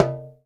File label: GENDANG B4, DRUM; Spectrasonics; Heart of Asia